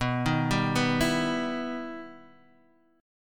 B Major Flat 6th; arpeggiated

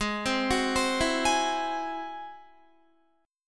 Ab Augmented 7th Ab down Guitar; arpeggiated; Ab+7; JGuitar

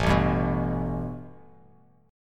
A 7th Suspended 2nd Bass Standard; A7sus2 strummed

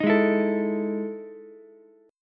E Major 11th E down Ukulele C6; strummed; JGuitar

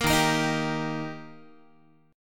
D sharp Major D sharp Guitar, chord; strummed; 13,11,13,13,11,x; JGuitar

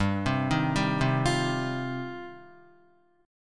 G 7th Suspended 4th Sharp 5th, Guitar; G7sus4#5 arpeggiated

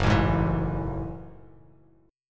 Gb Minor 9th, Bass Standard; strummed; JGuitar

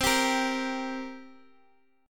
G Minor Double Flat 5th, C

